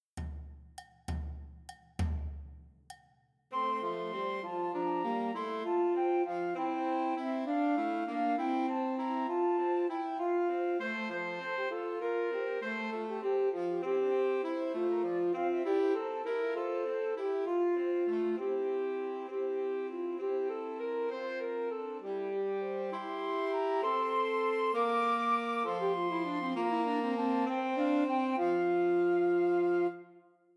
Sussex Carol, Christmas Trios for Flute Alto Sax and Tenor Sax